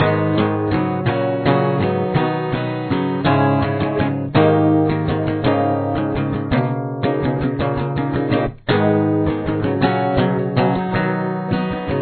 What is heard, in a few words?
chorus, guitar; You must use a capo on the fourth fret for this song.